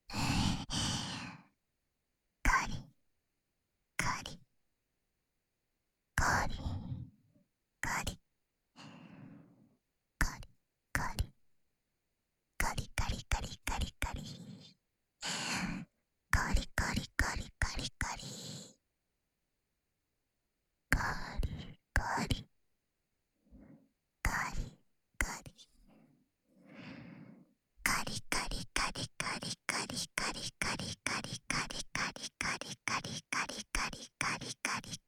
乳首攻め（かりかり・左）（SEなし）乳头刺激

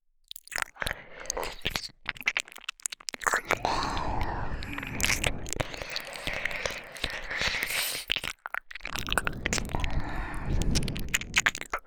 耳舐め 左耳, ASMR; こっちから耳舐めしてあげるね

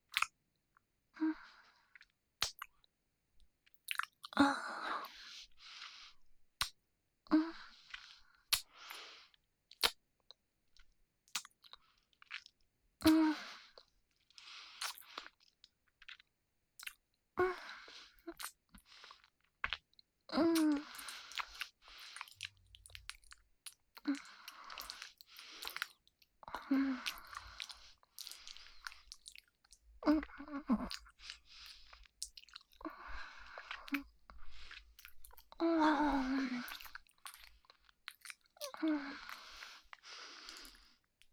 キス(接吻)

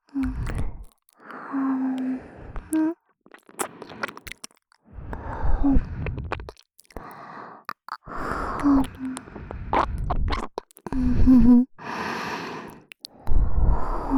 はむ…はぁむ…, ASMR, 左耳

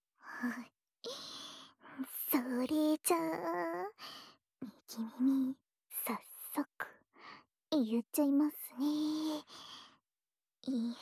耳かきトラック, ASMR

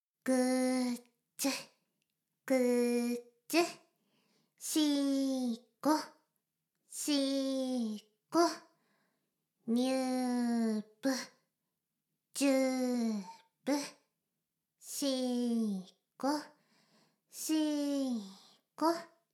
シコシコ音声; 魔法少女マスカリマッスル

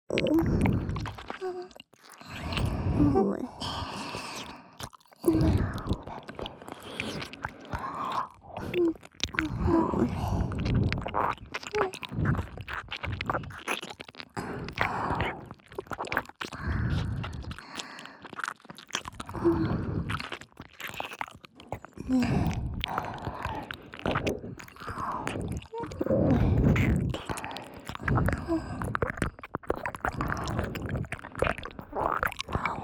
耳舐め; 反転

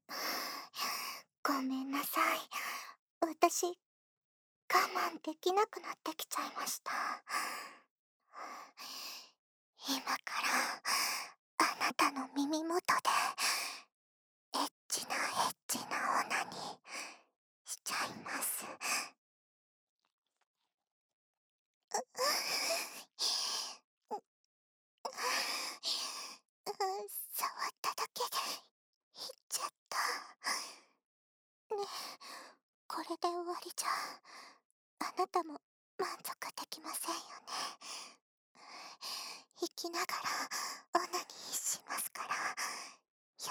興奮した彼女が耳元でオナニーし始める（左耳）

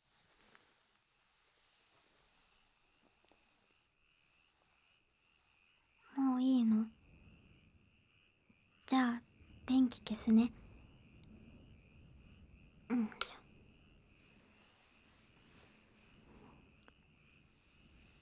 情緒安定添寝吐息

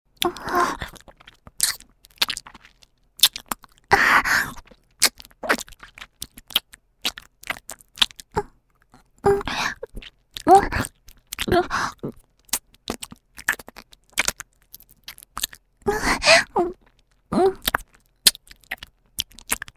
キス音

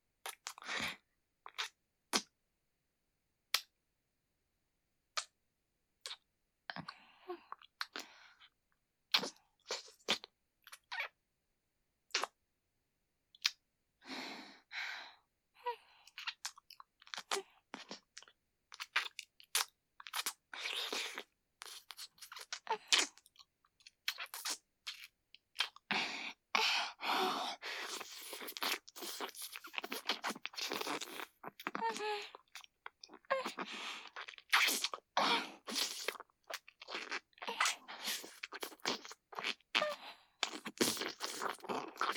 キス(接吻)

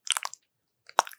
くちゅ音

